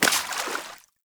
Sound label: Short Sword Medium Impact Water; Weapon Impacts; Player; Swords; Environment